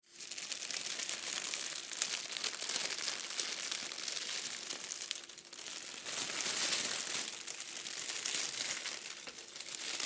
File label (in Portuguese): CELOFANE